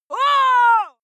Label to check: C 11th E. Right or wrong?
Wrong